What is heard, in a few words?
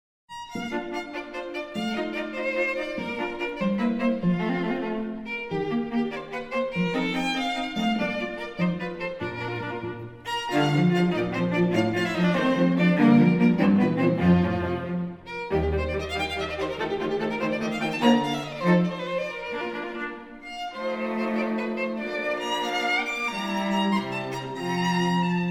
trio